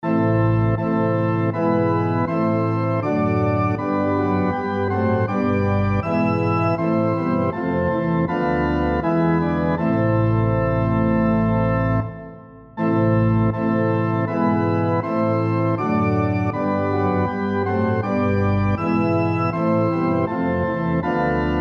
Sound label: SATB on Piano Example